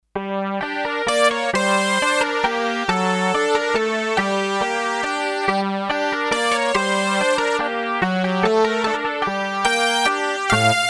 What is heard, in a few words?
Kawai K5000S